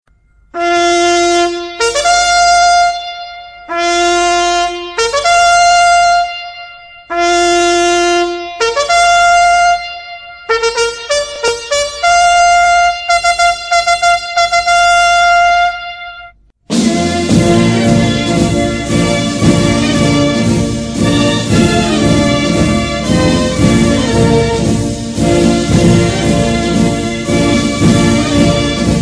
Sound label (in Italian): Alzabandiera, squilli di tromba in caserma